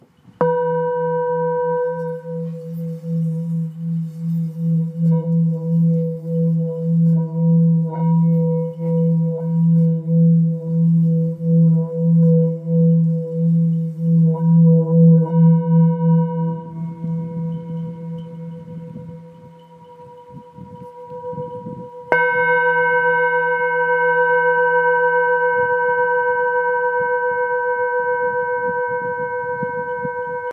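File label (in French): Full moon mi, bols chantants tibétains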